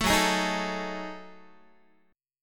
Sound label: F sharp Minor 6th Add 9th, Guitar; strummed; 12,14,11,13,13,x; 4A,4E,5A,5D,5G#,6C#